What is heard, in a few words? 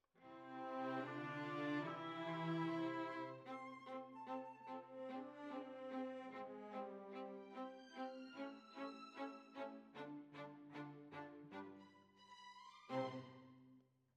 Violas, jazz for piano